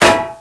fuel tank small, destroy

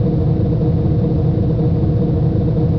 engine; de abducted

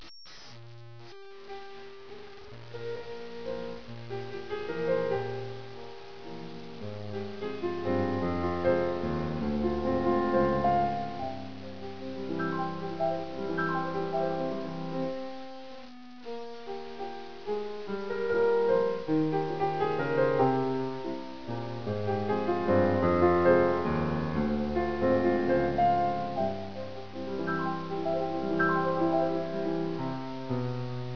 piano

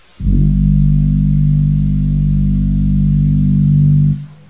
fog

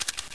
zoom, weapons; svencoop

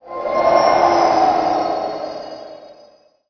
scary

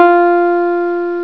synthe

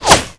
whizz bullet

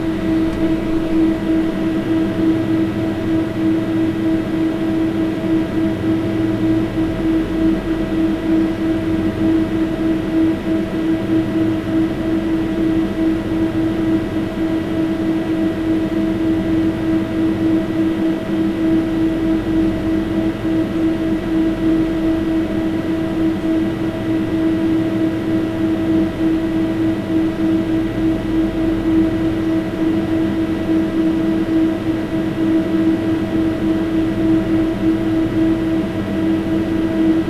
refridgerator

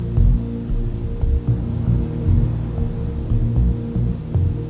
casino; runlolarun

